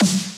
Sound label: snare